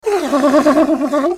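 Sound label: attack, basilisk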